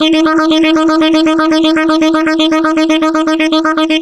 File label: Techno Sax; Effected; Sonic Foundry (Sony Creative Software); Jazz Solos and Sections